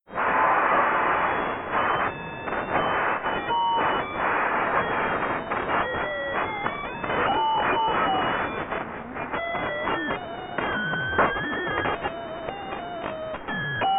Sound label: ultrasound lattice; sound of ultrasound lattice